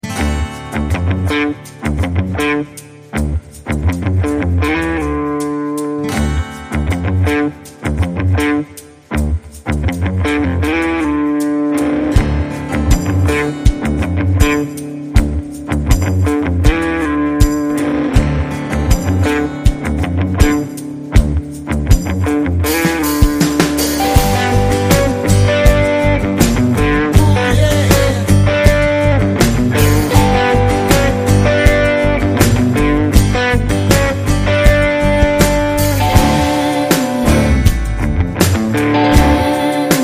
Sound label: Vocal Mix